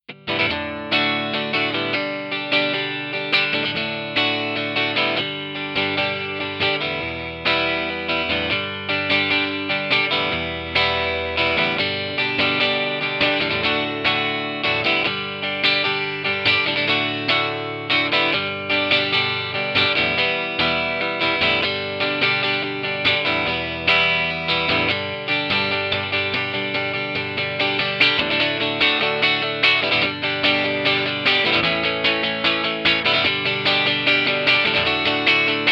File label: Sontronics EL GTR SM57 mono